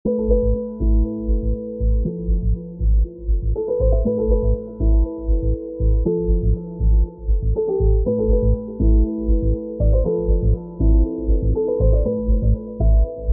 pianovibe; weeklybeats 2026

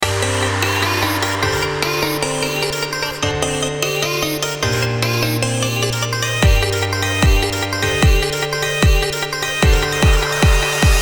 melodic dubstep; weekly beats 2020

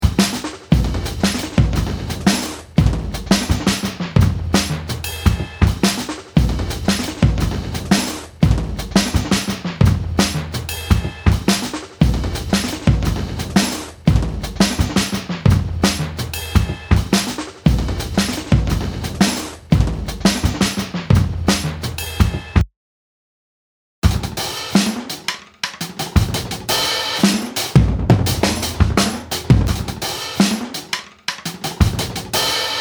machine drum loop

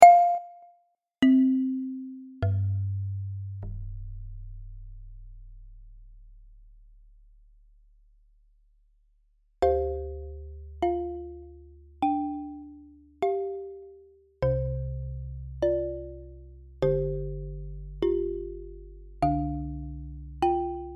the impossible marimba; weeklybeats 2012

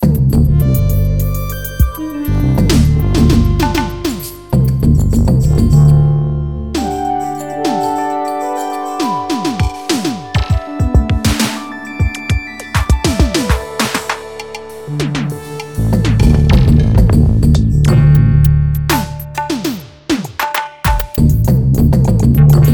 drum machine; drum machine in ya

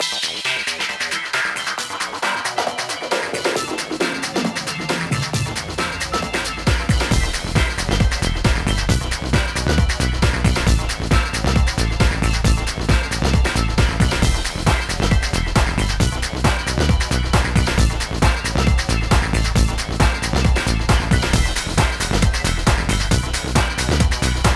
rave organ jam; weeklybeats 2026

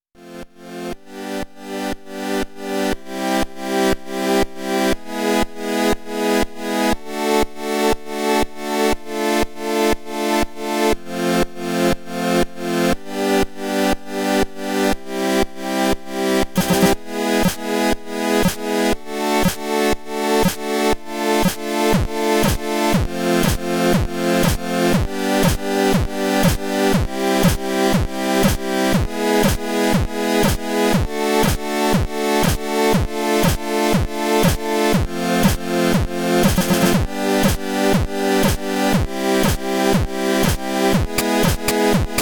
teenage engineering po-20 arcade jam; retro gamer; weeklybeats-2020

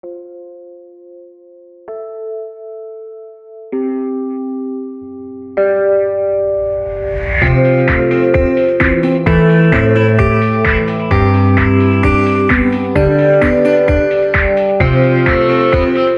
saxo something

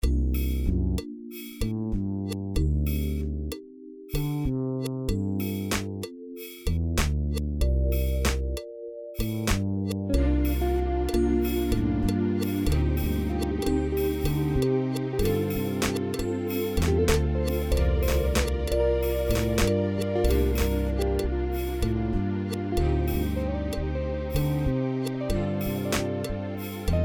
drums; drums and more part deaux

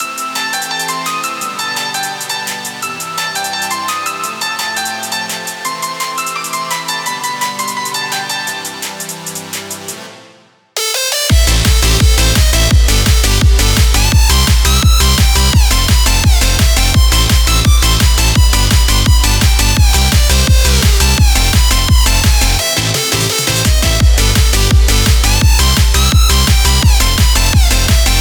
happy screams

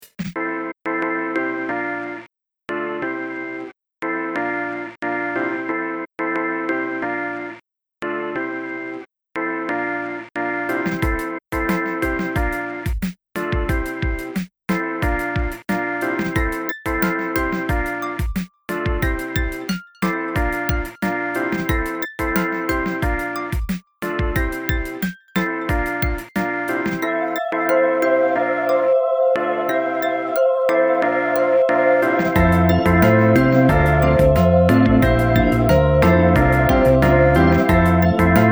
island beat; weeklybeats 2012

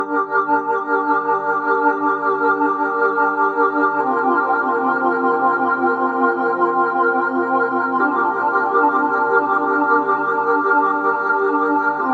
phone loop; weeklybeats-2020

